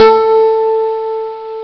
d50 synth bell hi, Amiga Soundtracker Sample Packs